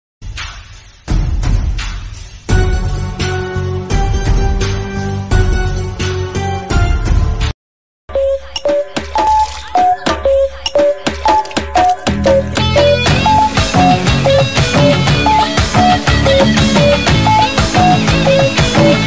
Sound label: bgm; theeratha vilayattu pillai; tamil